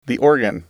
organ

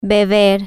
drink

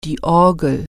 organ

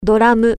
drums

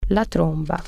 trumpet

